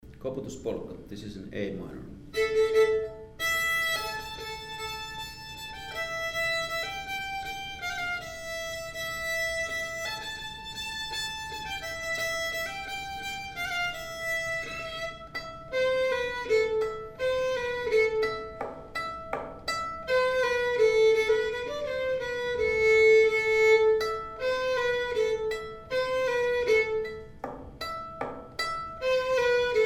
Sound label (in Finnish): Polkka; Koputus Polkka